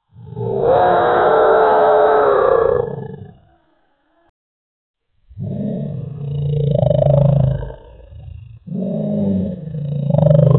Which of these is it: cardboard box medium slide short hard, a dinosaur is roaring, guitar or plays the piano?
a dinosaur is roaring